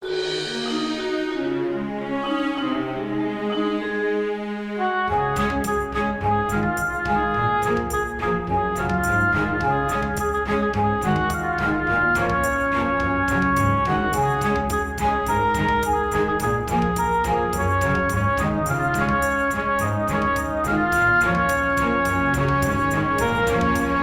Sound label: Orchestral Cover